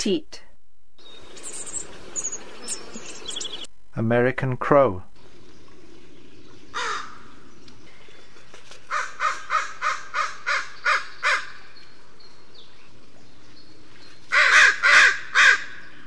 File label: American crow